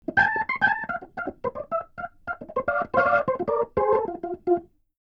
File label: keyboard